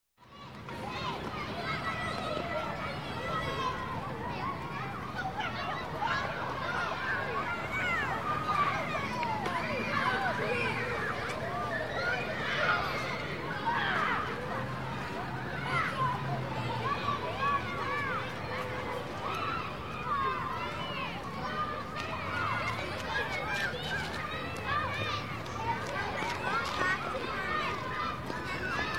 Children Schoolyard - Playing, Recess Bell, Amb; Ambience; Sound Ideas 2000 Series

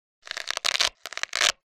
Walkie Talkie Static End Of The Connection Noise; Hollywood Edge; sound designer's toolkit v2

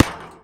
Street Ball Metal Back; SFX Kit

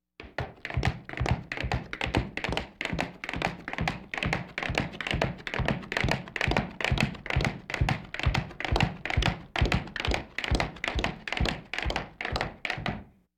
Laugh tracks

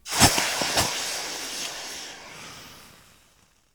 Steam Sizzle Hit

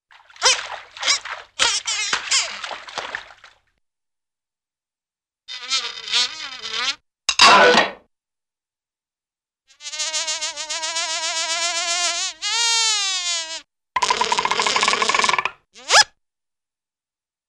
Fly Sounds, Cartoon; Hollywood Edge; Cartoon Trax

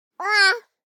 BABIES TALK Boy 12 months Uhhwaa; Baby Boom

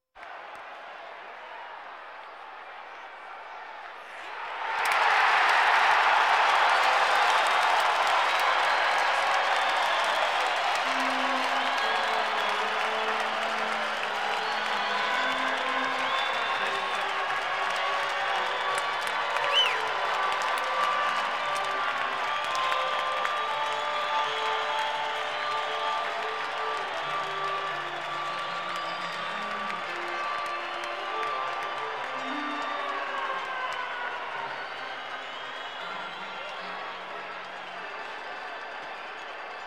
Sports crowds

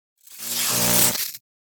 Electric Arc Short Circuit Electric Shock; Hollywood Edge; sound designer's toolkit v2

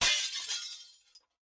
glass break; Blind Drive

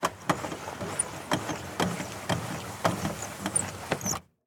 Semi Truck Window; Large Vehicles; Blastwave

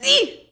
Boxing Female Punch Grunt

